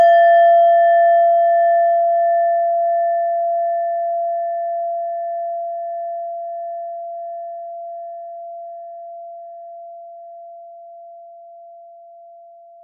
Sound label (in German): klangschale nepal, Klangschalen Nepal